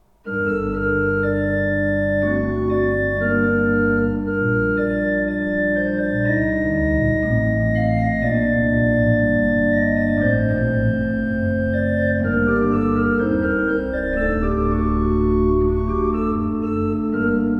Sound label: pipe organ; Schuke Potsdam 25 II P